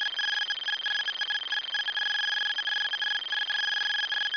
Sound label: text appear